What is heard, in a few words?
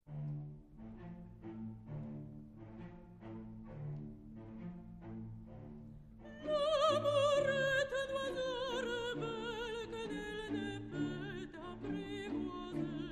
mezzo